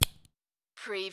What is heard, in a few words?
Lego, pull apart two lego pieces